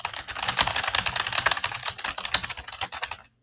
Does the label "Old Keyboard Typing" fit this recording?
Yes